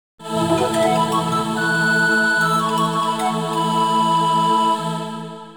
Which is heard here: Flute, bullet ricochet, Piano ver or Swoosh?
Flute